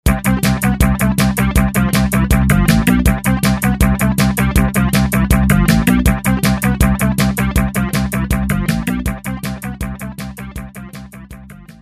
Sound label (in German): theta 6 Hz Musik